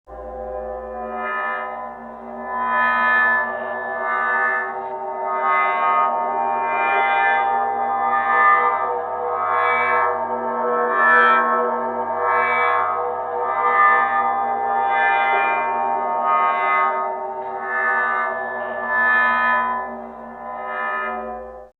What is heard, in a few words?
skinwalker search; fleshgait; slender skinwalker